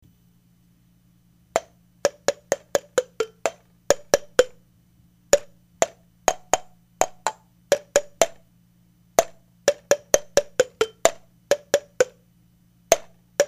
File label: beer phone; ビールフォン; リサイクル楽器